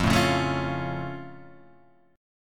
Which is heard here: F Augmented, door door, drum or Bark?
F Augmented